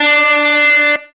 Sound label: electric guitar